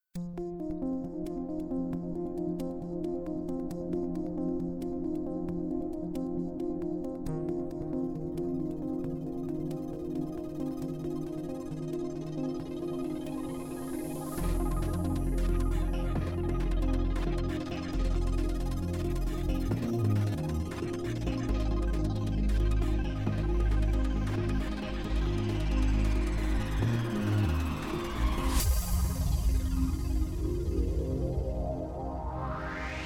Intro Music; Starblood Arena

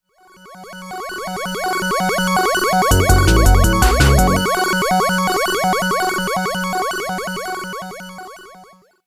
Science Electro

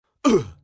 Hit; Russian Life Simulator

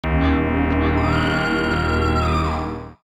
splatter; Namco Museum

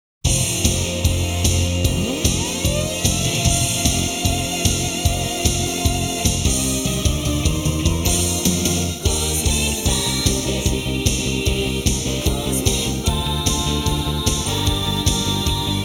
Instrumental Version; Cosmic Fantasy 3: Bouken Shounen Rei

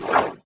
Sword; ChooChoo Heroes

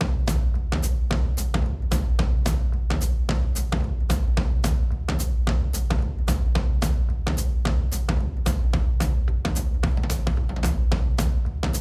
Loading Track

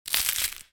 Chocolate Break; Cookie Jam

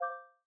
terminal tool; teardown; ps5 windows xbox series xs

